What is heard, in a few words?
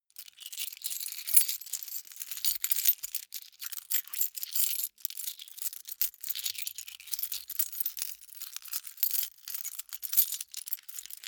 key ring large rattle sequence; objekte; schluessel